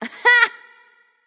laugh; smite

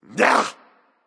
grunt attack claw; smite; voicepack; set

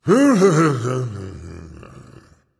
grumbling; smite; voicepack; ymir

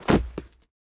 defeat hands drop; smite; kuzenbo

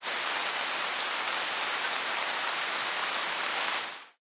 rain recall; smite; chaac

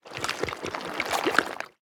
step; smite; poseidon

